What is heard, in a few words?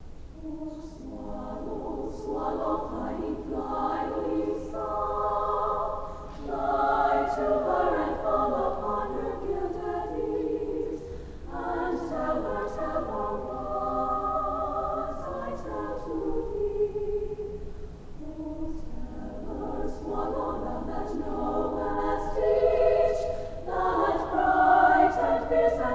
chorus; Holst